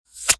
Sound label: attack, hungry snake; Garry's Mod